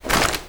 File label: foley gear rattle sprint; Call of Duty Modern Warfare